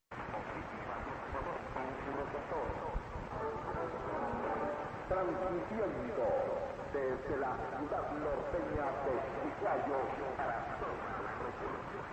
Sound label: R Horizonte Chiclayo 4505 kHz; Peru